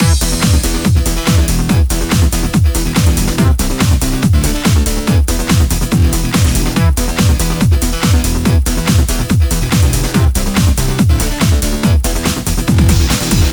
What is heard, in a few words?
In Game Loop; Move or Die; ps4